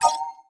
ui dialogue next; ui VN dialogue next

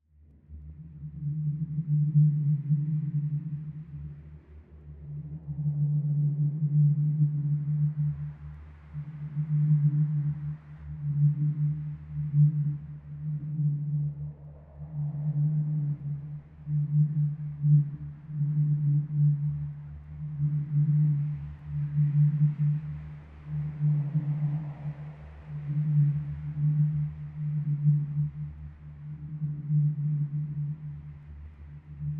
Main Background Bpm198

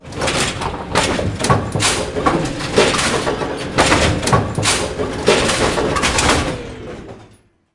Sfx Bowling

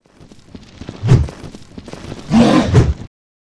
Golem Attack

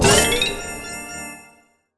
Collect; Go, Kizi, Go!; Collect Snd Collect

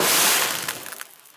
Laser beam damage; Likho

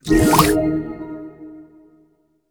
Panel Transition In

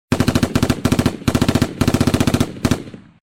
Gun Fire; Mars Sortie

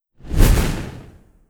Attack Arrow Flame; Tracker

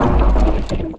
Door

